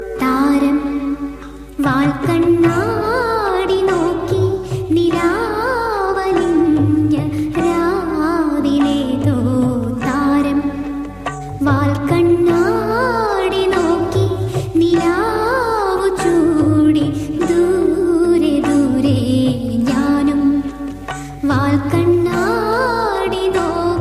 Romantic Song

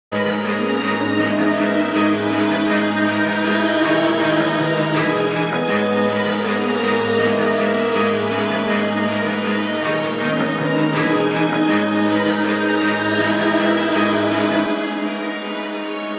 Eerie ringtone

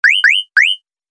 Message whistles

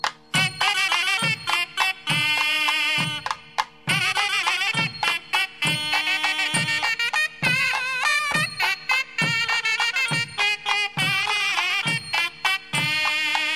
Western Notes

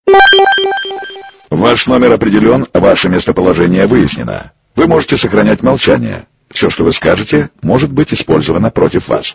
Sms Sound; Kgb

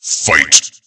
Fight

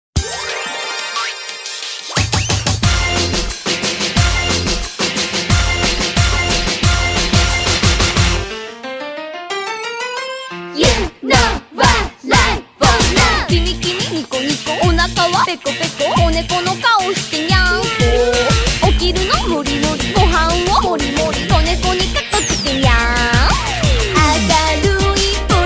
Cute Song

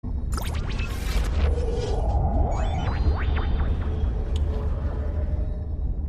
Tardis warble

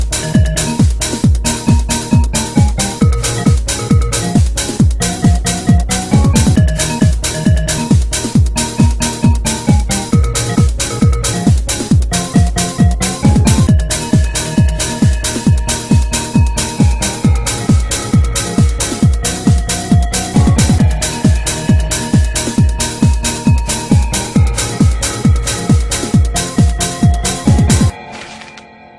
Windows Xp Mix

